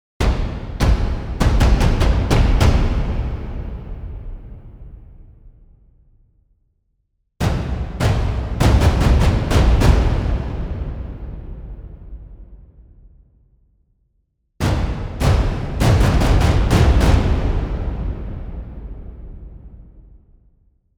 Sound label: Low Ens tight loose flam; Strikeforce